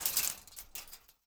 FOLEY Metal Fence Shake Subtle mono; Phasmophobia

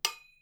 metal pipe; Phasmophobia

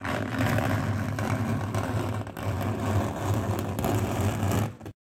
Crucifix Turn; Phasmophobia

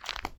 Wet footstep; Phasmophobia

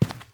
Footstep Carpet; Phasmophobia

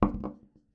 wood; Phasmophobia

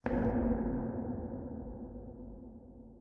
Step; Phasmophobia

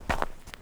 footstep trainers snow compact walk fast

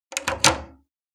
Door key lock; Phasmophobia

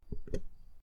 Music box open; Phasmophobia

